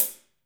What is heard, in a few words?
HH hh; Best Service Gigapack 2